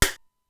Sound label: Simmons SDS-9